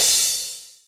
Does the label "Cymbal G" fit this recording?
Yes